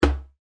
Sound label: bass